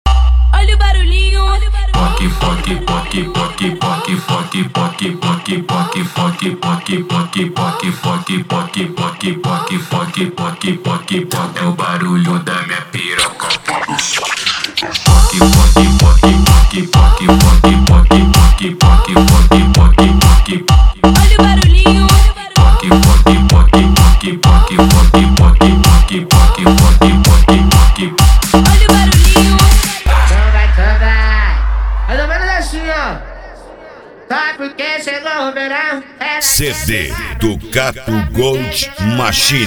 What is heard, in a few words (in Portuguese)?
mega funk